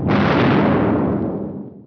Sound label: bomb bang